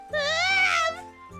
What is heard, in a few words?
aaarrrggh; IRyScream